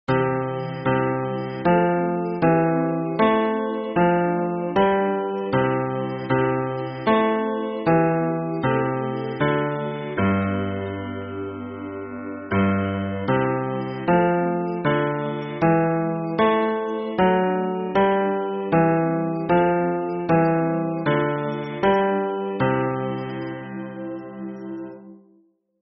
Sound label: hymn bass